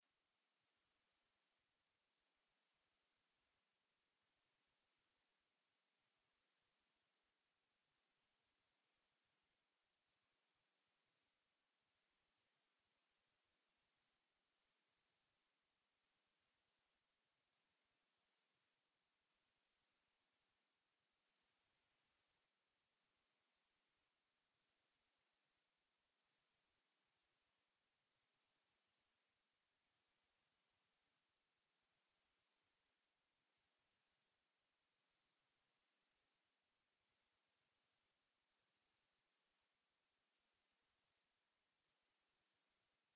japanese crickets sequence

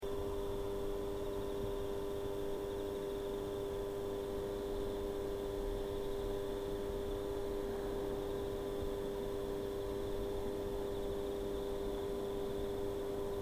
light humm

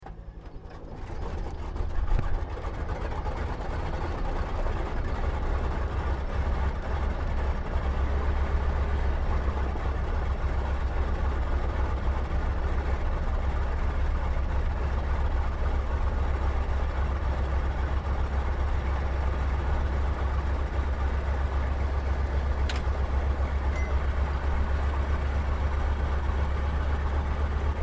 aircraft t-28d trojan startup interior; 1963

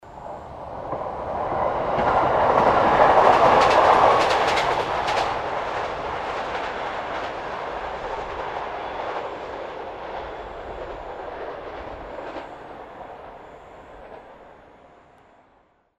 sannohe train passing

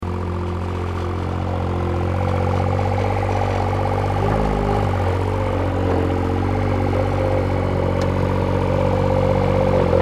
construction earthmover small operating

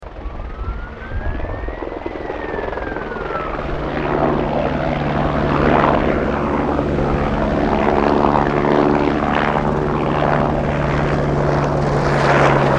firefighting helicopter

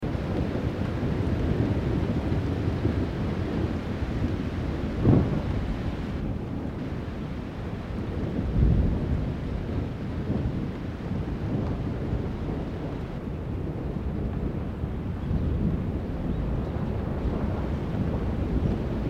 ambient texture

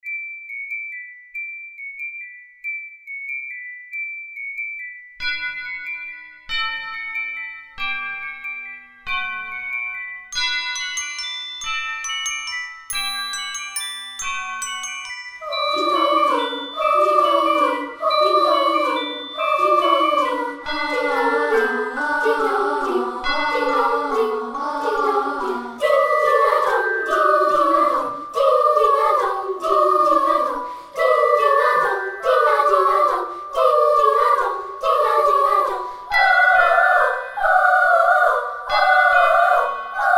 carol of the bell

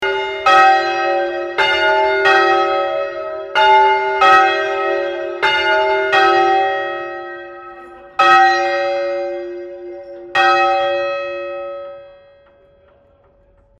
bells st pauls

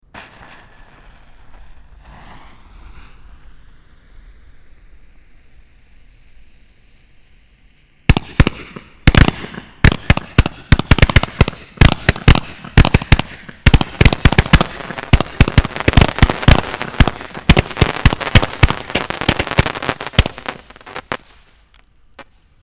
fireworks launcher multiple 50 shot

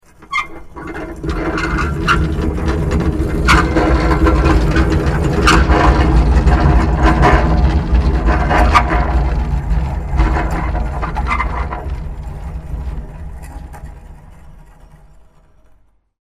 dumpster large metal moving fast stereo

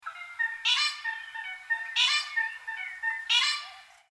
bird little wattlebird call

